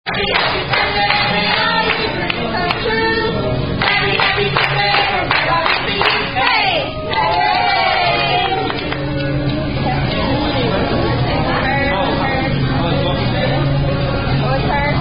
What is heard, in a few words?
Red Robin Happy Happy Birthday